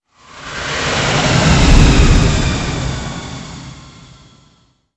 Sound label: jet down; Zaero